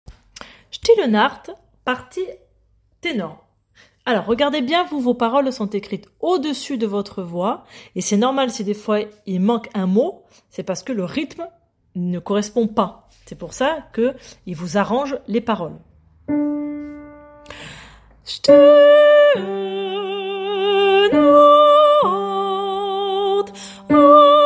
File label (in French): Silent Night partie tenor, choeur; Still nacht